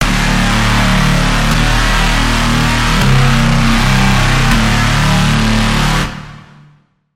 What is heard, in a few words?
Braam - Battlefield; Routed Sound; Vital Expansion - Quantum Enforcer Vol.1